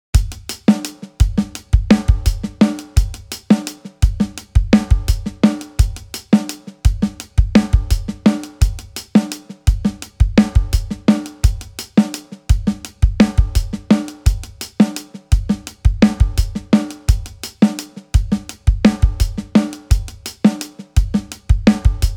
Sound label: Drums